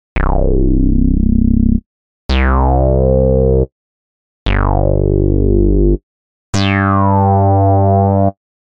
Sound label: CHORUS BASS Arturia Modular V3